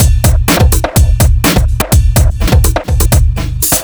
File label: Off Beat B